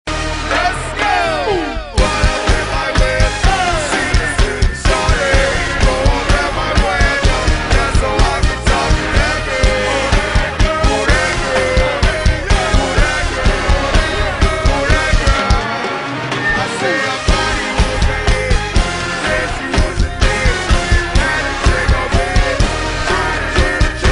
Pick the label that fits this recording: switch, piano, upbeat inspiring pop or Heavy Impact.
Heavy Impact